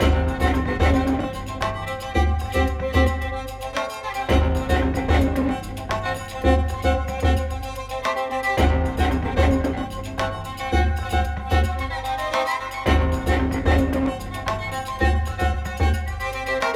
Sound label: Combat loop; raji an ancient epic